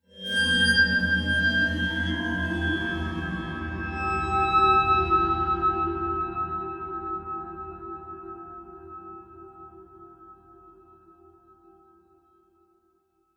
amb startup metal; Half Life Alyx

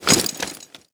suit, footsteps; player

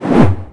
scanner near miss; npc; Half Life Alyx

